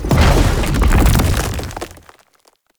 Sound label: Knight Spawn Burst; Enemy; Characters; Abilities; Mendicant; Mnd King